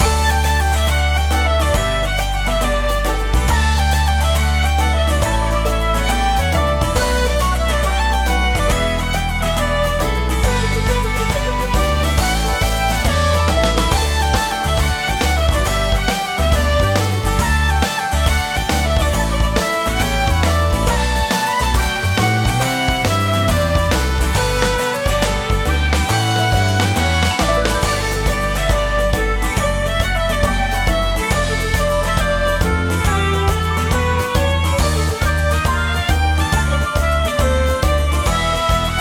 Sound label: Town